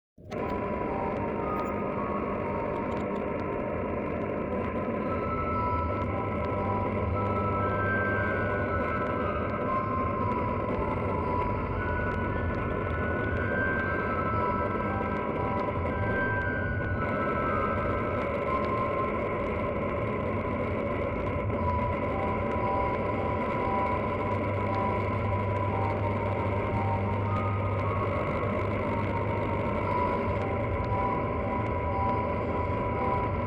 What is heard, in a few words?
Radio; Town of Light